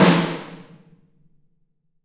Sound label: RM SN, STEREO AMB SNARE